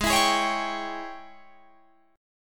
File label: G sharp Major 7th Suspended 4th Sharp 5th, Guitar, Drop D